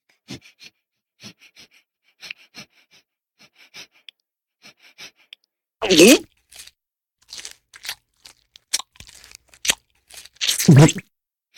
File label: beg eat swallow; Poodletooth iLand